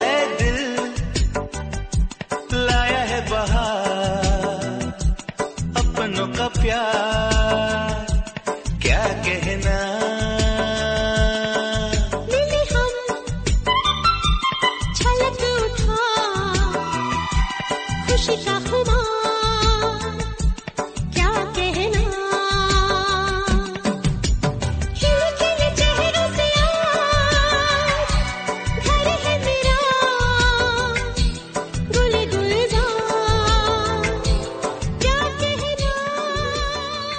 Hindi Song

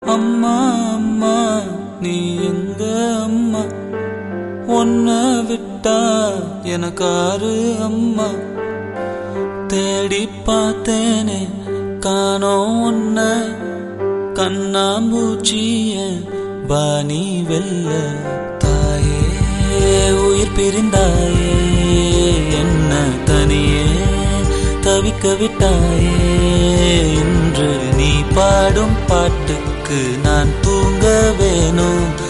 Tamil; ringtone; Velai Illa Pattadhaari

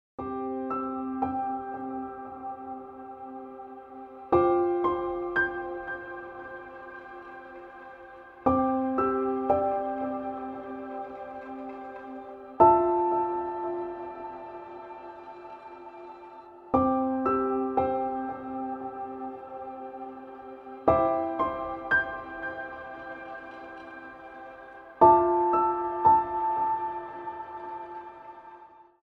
Ringtone Piano Music

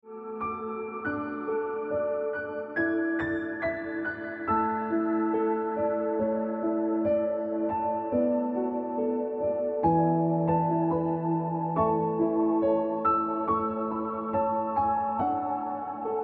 Ringtone Piano Music

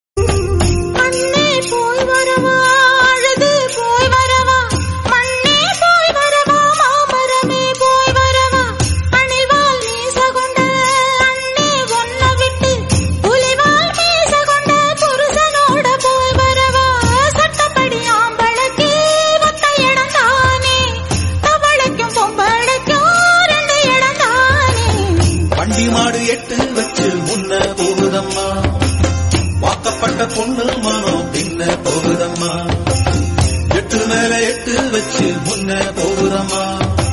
tamil; Kilakku Cheemayile

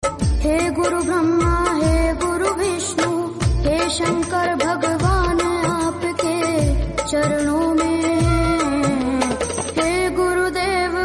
Bhakti Hindi

